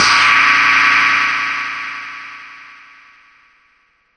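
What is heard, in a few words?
Yamaha RS7000 hi-hat cymbal Ab6; drum machine; Yamaha RS7000 Cymbals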